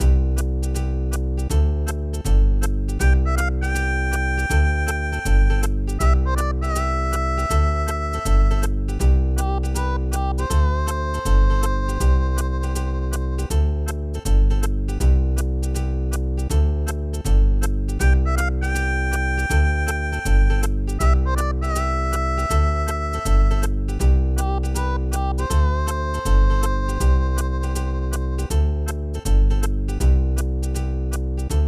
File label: TV Commercial – Drink; animal crossing new leaf welcome aamiibo soundtrack; ACGC Startup Theme